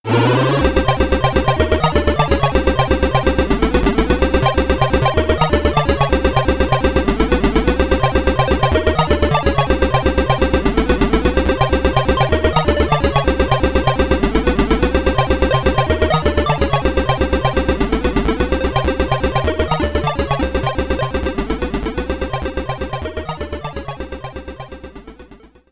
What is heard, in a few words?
Hurry Up (SID Stereo); solomon's key commodore 64